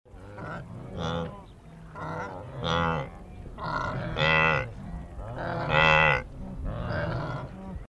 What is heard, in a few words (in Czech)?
antilopy; zvuky zvirat